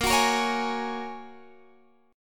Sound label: A sharp Minor 7th Sharp 5th, down